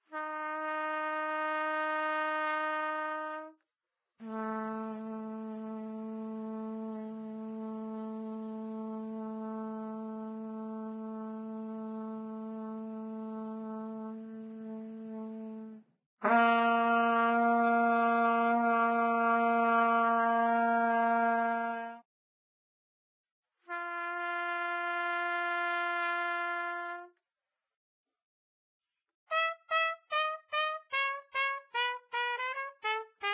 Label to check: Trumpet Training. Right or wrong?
Right